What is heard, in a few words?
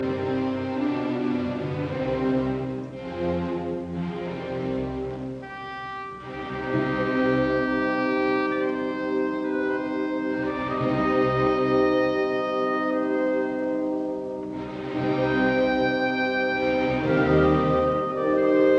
Symphony in E flat major Marcia funebre Adagio assai; Beethoven; Op 55; Eroica